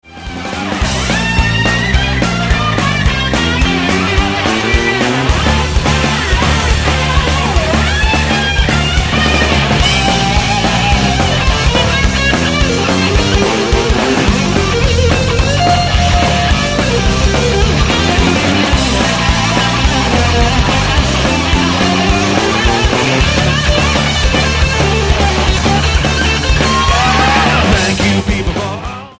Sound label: raw blues